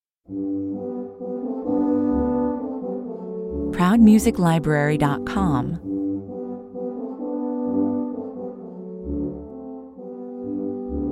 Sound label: brass 131 bpm